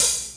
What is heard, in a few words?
clean open hi hat sample a key